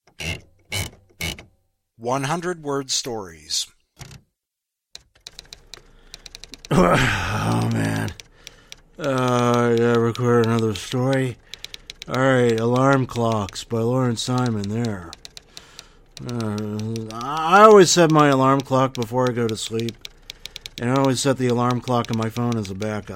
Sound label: alarm clocks